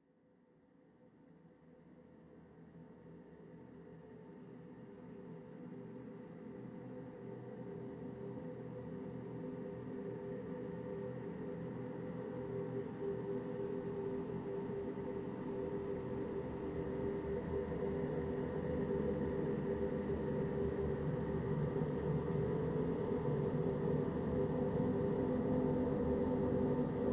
Music alien deep forest; starforge windows gamerip 2013

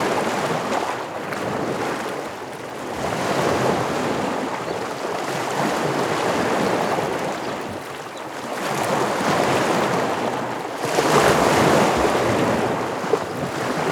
Beach; Legend of Grimrock 2

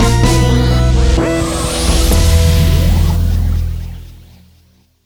Lose Stinger; assault on metaltron ps4 switch windows xbox one gamerip 2019

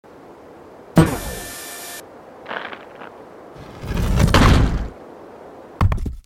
Laser; Breaking The Bank

